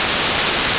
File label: WHITE NOISE; E-MU Formula 4000 Series Vol. 2